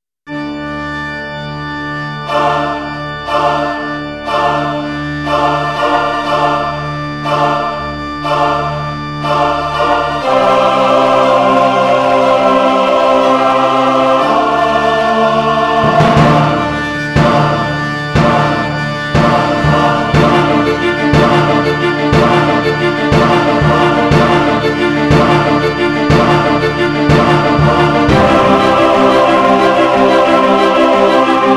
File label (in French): Orchestre Symphonique; Pantheon